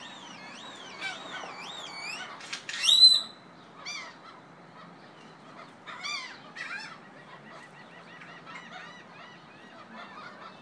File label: seagulls